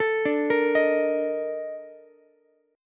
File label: A sharp Major 11th Ukulele, chord; A#M11; arpeggiated; JGuitar